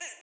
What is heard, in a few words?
Duck, Old Skool House, 120bpm; Big Fish Audio; Construction Kits; House of Amnesia